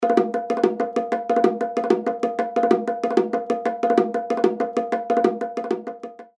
Bongo Groove; Apple GarageBand 2; Apple Loops

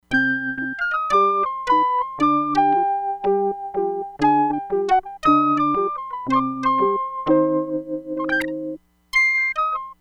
CHOP FLUTE, Crumar Bit 99; Factory Programs

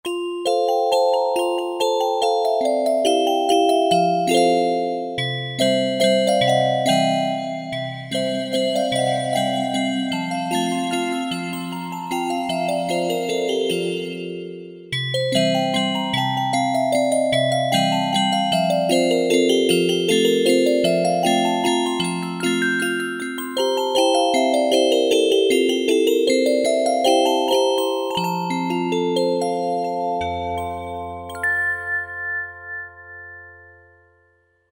Toy Box, Korg Wavestation A-D

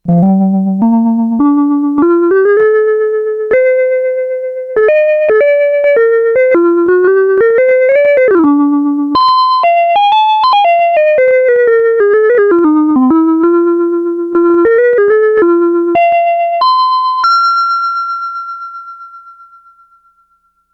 Vibraphone, Roland SH-2000

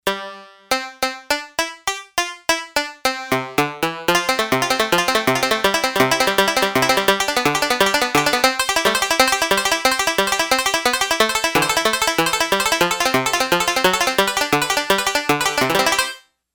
Yamaha DX11 Banjo; Factory Preset Voices

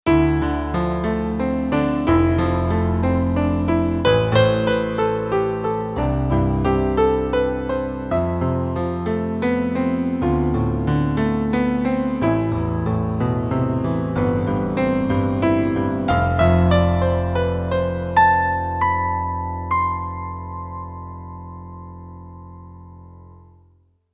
A.Piano 1, Roland JV-880; Factory Patches

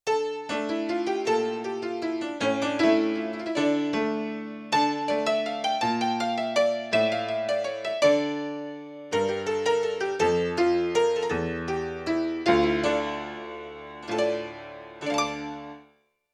Moving Mic, Yamaha CFIII Grand Piano; Yamaha TX16W

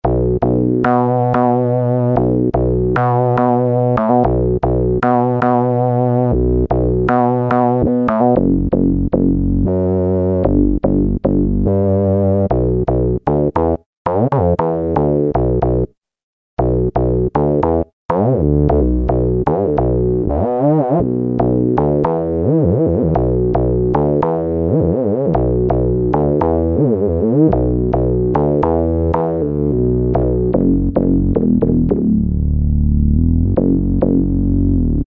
Chirp Bass, Kurzweil K2000; Kurzweil K2000 Contemporary ROM

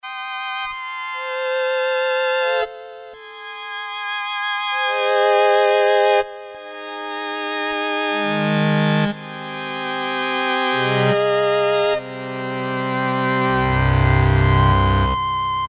pipe organ + envelopes, Casio MT-68; tweaked preset sounds

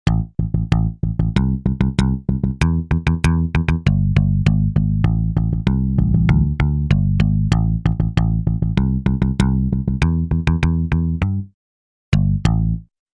Pick Bass, Roland JV-880 Factory Patches

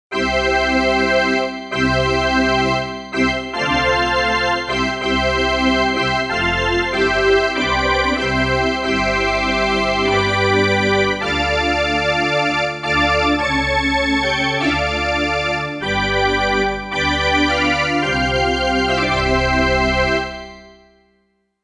Pipe Organ, Roland JV-880; Factory Patches

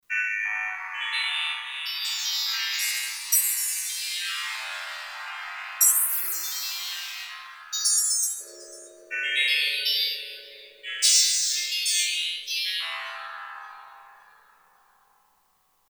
Mark Tree, Kurzweil K2500X; Factory Programs

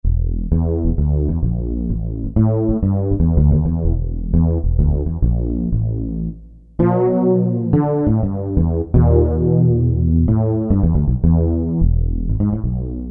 Fretless Bass, Roland U-220; Factory Patches

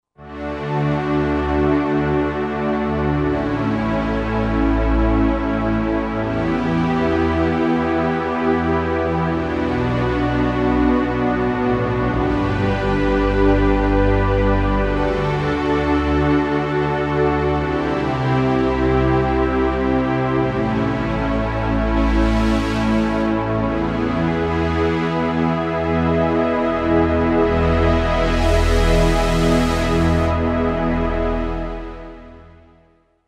OB Thick Pad, Vintage Synth; Roland SR-JV80-04

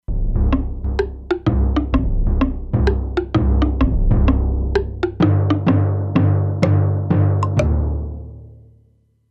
Timp Low Block, E-mu Proteus-2; Factory Presets

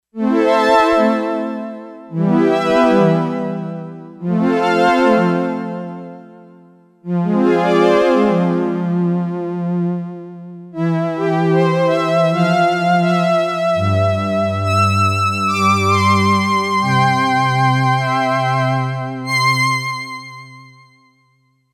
Strings 2, Yamaha DX11; Factory Preset Voices

